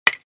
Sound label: Baseball hit aluminium bat